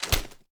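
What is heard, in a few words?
assault rifle reload fast end, weapons; viper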